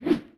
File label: fat woosh miss; DoomRTX